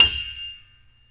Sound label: StereoGrand; E-MU Producer Series Vol. 2 – More Studio Essentials